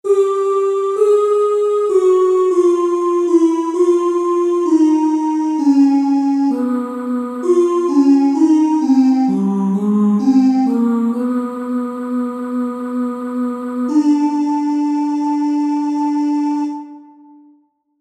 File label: Tenor, barbershop